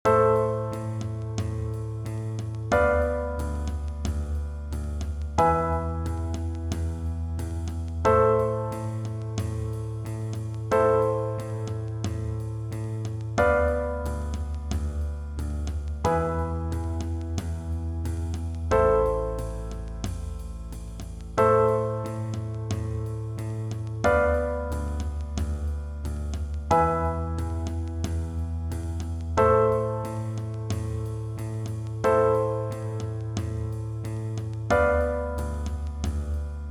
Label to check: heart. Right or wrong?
Wrong